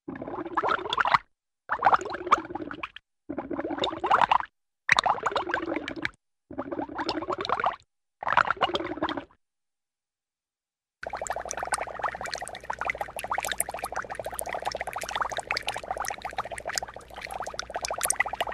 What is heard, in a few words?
Small Bubbles Ascend & Descend; Hollywood Edge Cartoon Trax